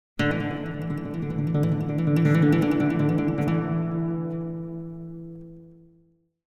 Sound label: Acoustic Guitar - Guitar Long Legato; Hollywood Edge; sound designer's toolkit v2